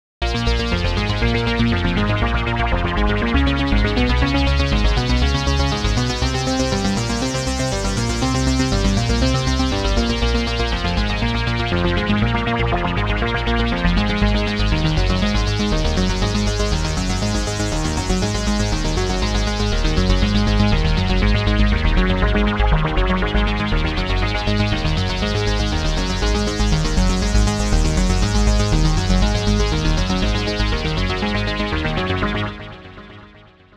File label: seq 16 80tys dance